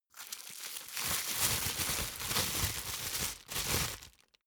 plastic bag unfold quick

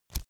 paper browse

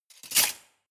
cutlery large multiple drawer place hard

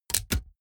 typewriter

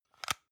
plastic hook fold in

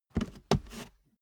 step barefoot metal scuff

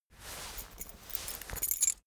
key ring small trousers pocket fetch

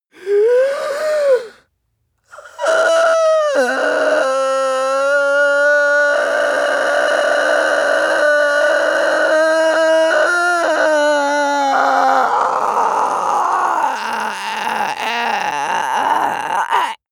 male inhale exhale whine hard

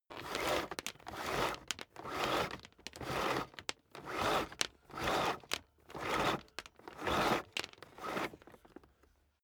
vacuum cable drag out sequence

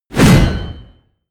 blade whoosh large

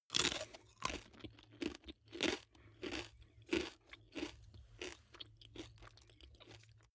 eat potato chip mouth closed normal

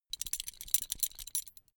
metal belt buckle clatter long heavy

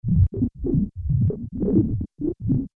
additional deep bubbling

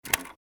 mechanical switch

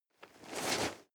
nylon leather medium short

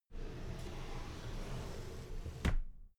drawer cabinet paper open soft

